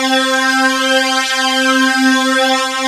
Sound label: SYNC SWEEP